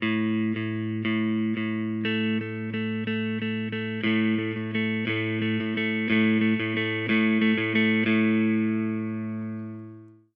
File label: Polyrhythm; creative wah pedal use; ragajunglism